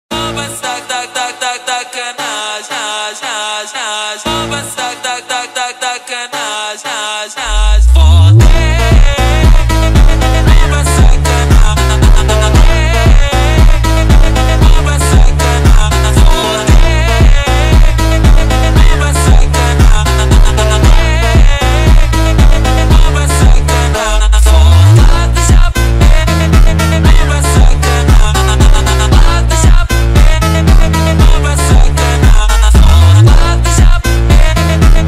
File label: montagem slowed reverb